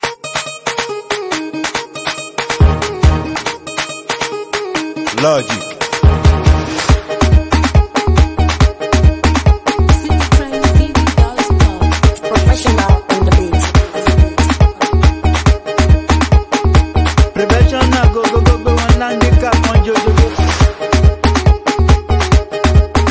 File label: Dance